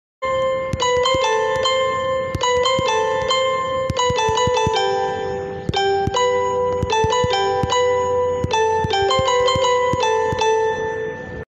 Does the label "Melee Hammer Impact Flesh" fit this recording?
No